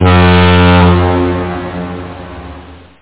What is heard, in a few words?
klaxon ferry; BaseAuckland2